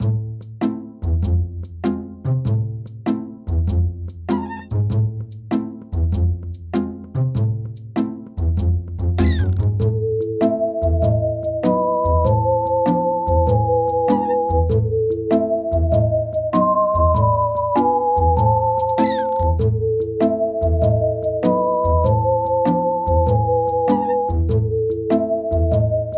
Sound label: Lobby; Cookie Run: OvenBreak